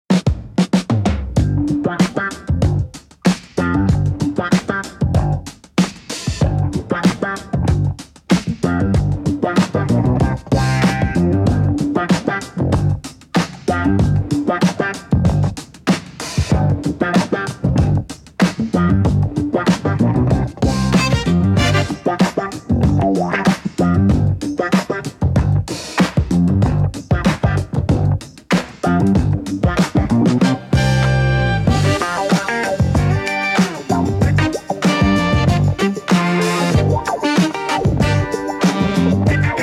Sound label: Rainy Day Jazz(95)